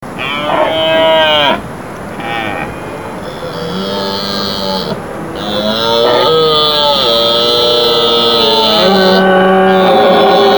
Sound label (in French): brame cerf